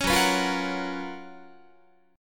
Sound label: Gb Major 11th Guitar; GbM11 strummed